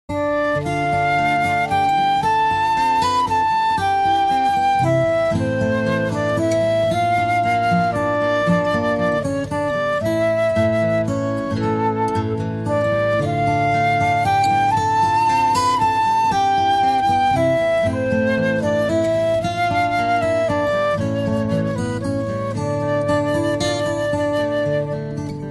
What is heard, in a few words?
GreensLeeves, instrumentalne